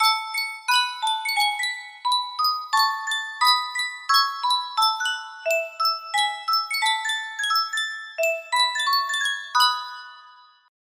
sankyo miniature music box, music box melody

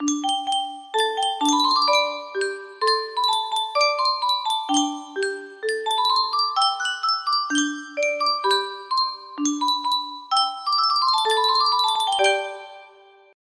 Yunsheng Mousikó koutí, music box melody

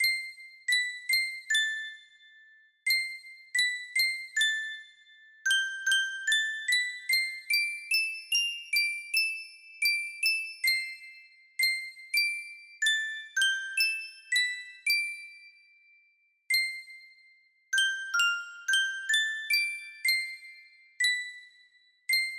non eerie, music box melody

